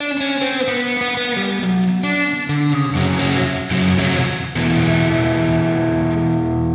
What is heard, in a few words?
bell tolls processed; pixel drive; digital symphonic products